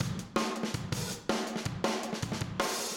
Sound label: Room, Drums, 162 BPM